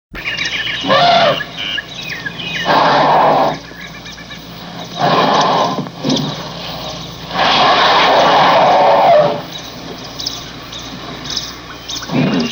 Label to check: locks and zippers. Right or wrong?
Wrong